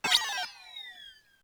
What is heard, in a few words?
Start menu disappear; littlebigplanet 2 ps3 gamerip 2011